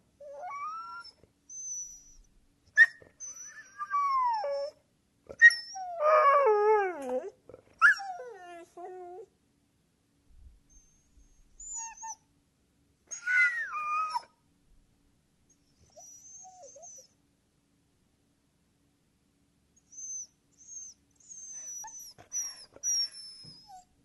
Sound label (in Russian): sobaka skulity